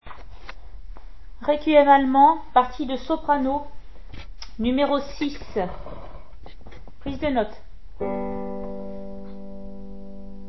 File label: soprano; Brahms requiem allemand; mesures 1 à 3 prise de note